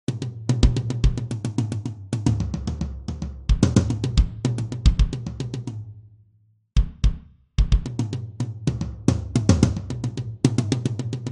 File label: Intro drums; Michael Aubrecht